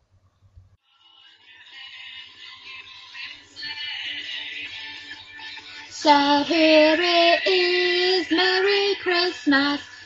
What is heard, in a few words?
kristmas karaoke